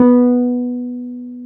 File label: PNO Wurly B; Keyboards of The 60's and 70's